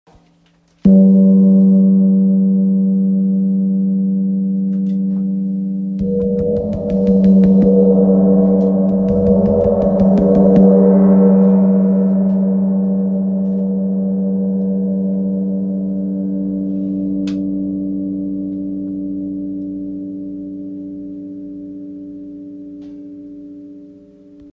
Wind gong sol 40cm

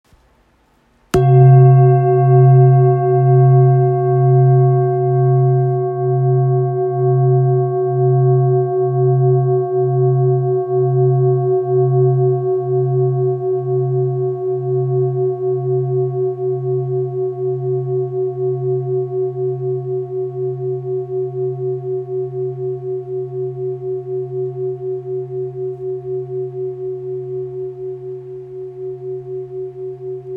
Full moon do

